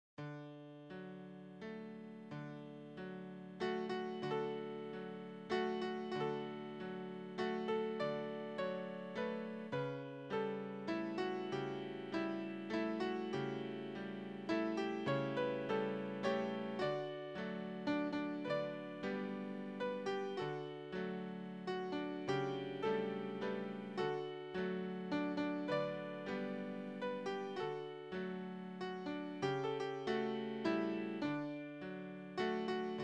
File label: lullaby, mypiano